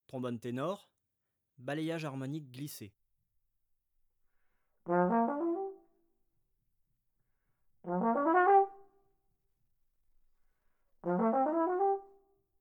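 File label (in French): tenor balayages harmoniques glissés, trombone; Effets; traite orchestration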